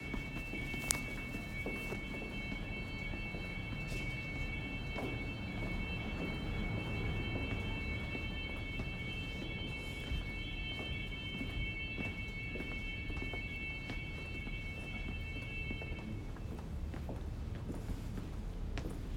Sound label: alarm ringing